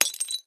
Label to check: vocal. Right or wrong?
Wrong